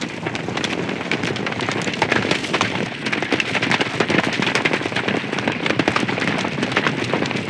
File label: Cav Gallop; Waterloo